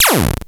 Percussive, Drums Perc One Shots

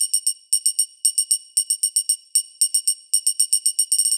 115 Perc Triangle; Zero-G; Future Wave

